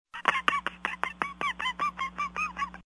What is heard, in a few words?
weez laugh; grandpa munster